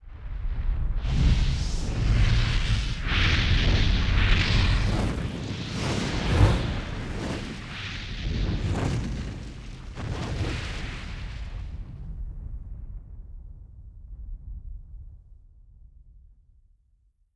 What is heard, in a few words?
FIRE RAIN RAIN